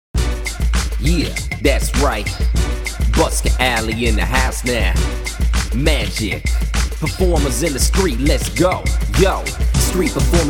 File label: Rap